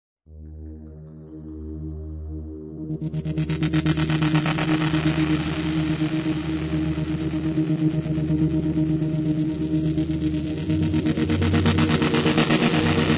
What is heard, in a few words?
sad helicopter